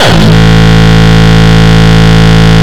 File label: Longkick, kicks